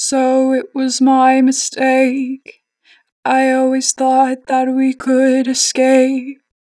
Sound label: Natural Vibrato, Vocals, Escape, 4 bar vib; Harmor Vocals